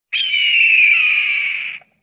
bald eagle screech

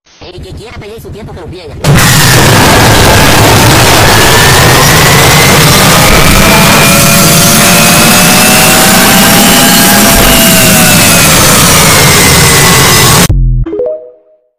rahhhhh; donpollo

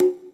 high conga

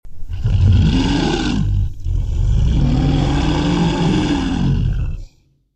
bear roaring

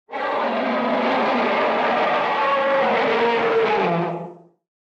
universal monster roar

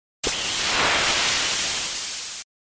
battle woosh

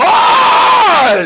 auuhhhhh

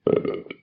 burp; talking ben

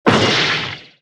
failed

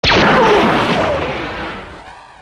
turbolaser

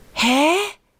haaaaaa

